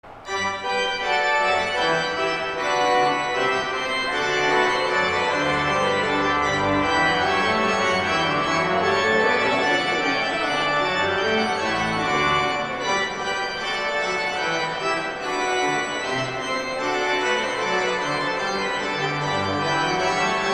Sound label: Organ, Gavotte; St. John's Cathedral, Jacksonville Florida; Johann Sebastian Bach; 'French Suite 5'